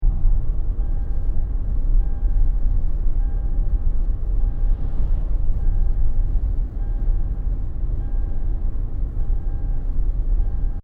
Car Toyota Kluger interior driving warning loop

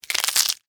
Crunch Celery; Texture; Crunches; Objects; BlastWave

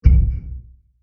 Low Stab; Low Impacts; Sound Effects:Impacts; CraftedSignals

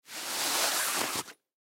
Clothes Belt Pull Through Loops Fast; Dressing Sound Effects; Human; Foley

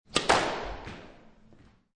Sports Squash Serve To Wall

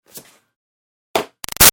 Foley Frisbee Plastic Throw-Catch; Sports; Clean; Miscellaneous Sports; Big Room Sound

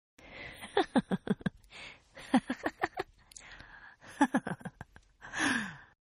Giggle Female, Laughter; Voice

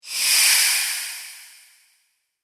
Poison Mist Spell, magic; Magic Sound Effects; fantasy sound effect

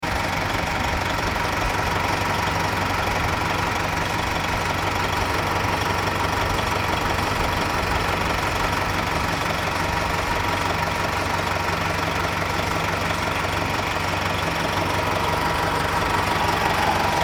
Commuter Bus Idles; C / U Perspective; Busses; disabled commuter bus engine; Vehicles

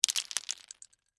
Debris Mixed Nuts, Pile; Texture; Debris Sound Effects; Materials

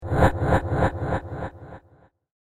Retro Echo Whoosh

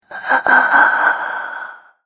Classic Zombie Breath; horror; Zomby

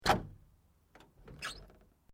Metal Light Movement Minor; Materials

